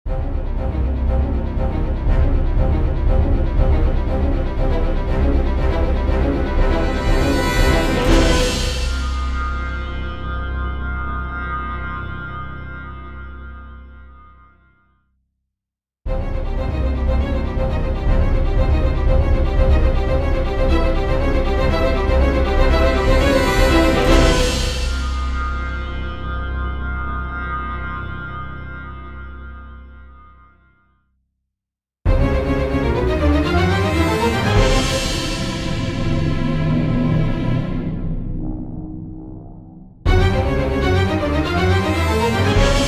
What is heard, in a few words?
Suspense and Horror Stabs